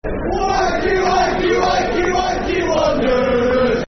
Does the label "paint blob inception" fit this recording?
No